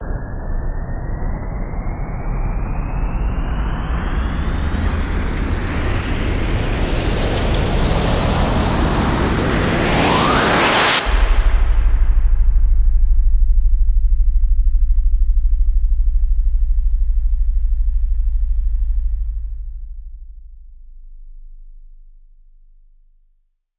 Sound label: Rise Long; Quench the Banshee